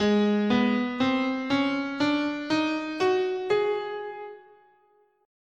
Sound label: G sharp Mixo Blues, scale